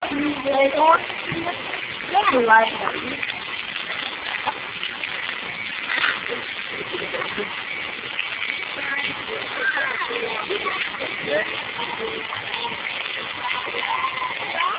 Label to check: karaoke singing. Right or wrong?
Wrong